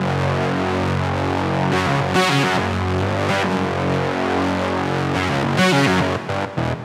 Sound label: Riddim Melodic Loop F# 140 Distopian; Ghosthack; Riddim Essentials Volume 2